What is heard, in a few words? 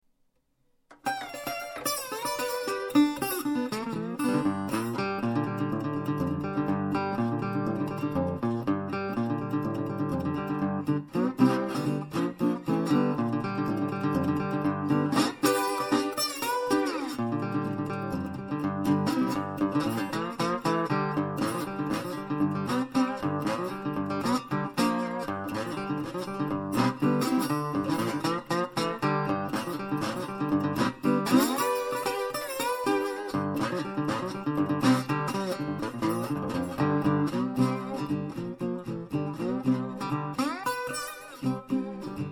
formentera acoustic, guitar